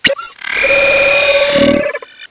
scan; hyperion

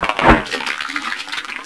attack

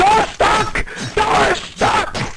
door stuck

